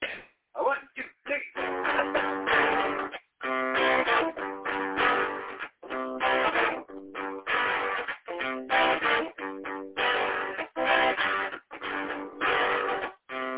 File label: southern rock chords for that lick